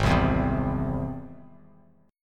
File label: G sharp Augmented G sharp, down; strummed; JGuitar